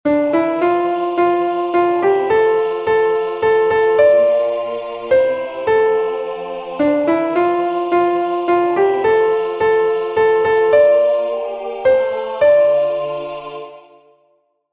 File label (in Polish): Alleluia sopran